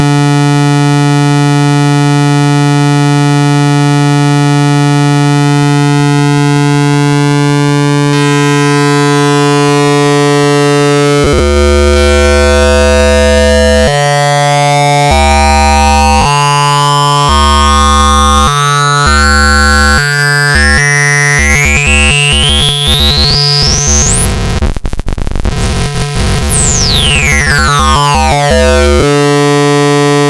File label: pulser no reset